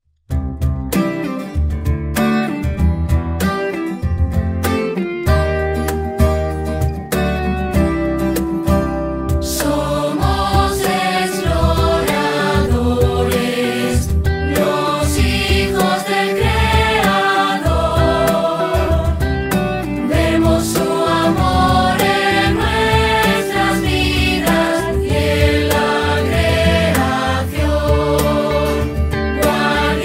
somos exploradores, acústico, cantado

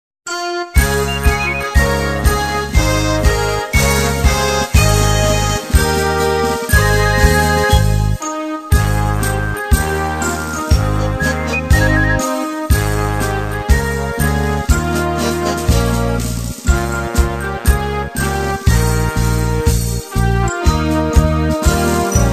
himno del conquistador, pista, desfile con flauta

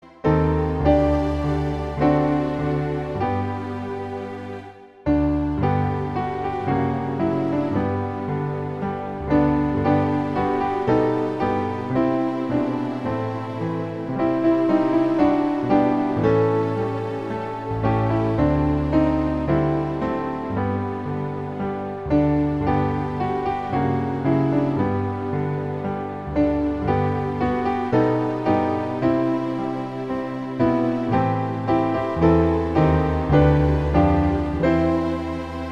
himno del conquistador, pista piano

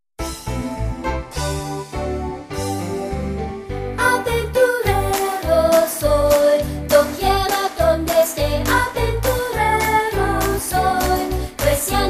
himno aventurero soy, cantado

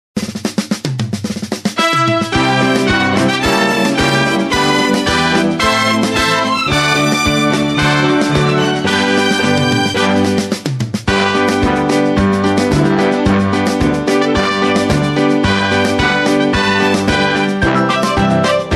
himno del conquistador pista fiesta

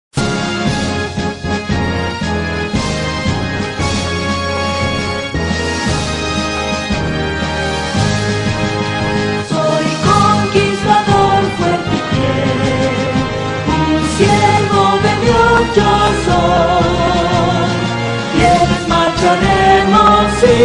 himno de los conquistadores cantado

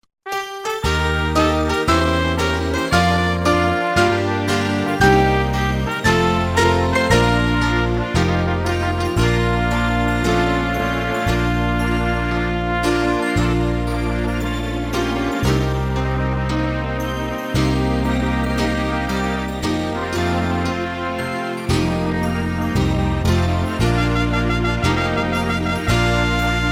himno aventurero pista

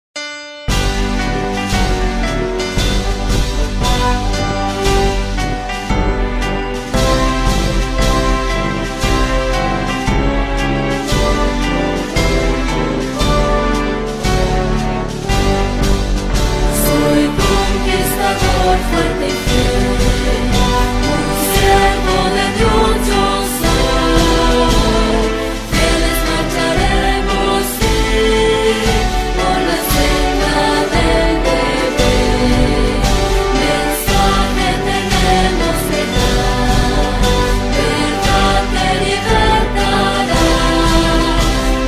himno de los conquistadores

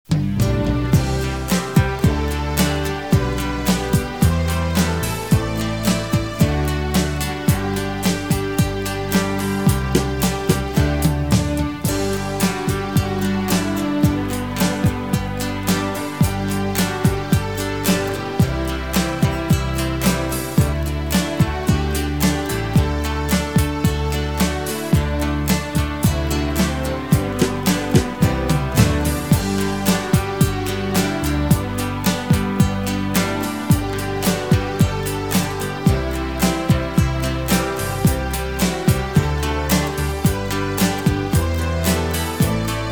himno del conquistador pista relajado

